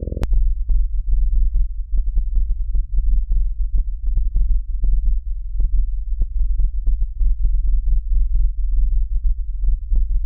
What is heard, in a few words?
makenoise spectraphon noise